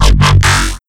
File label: Growl Reese